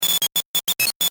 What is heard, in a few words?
Digital Blips Short Circuit Text Recieve; Digital Clicks, Blips & Alerts; Digital Sound Effects